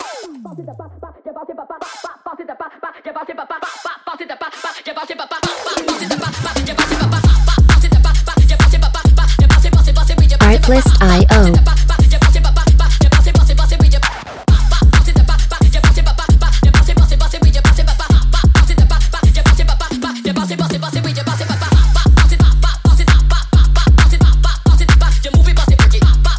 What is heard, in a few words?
Sped Up